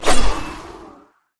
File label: skill bullet; kaze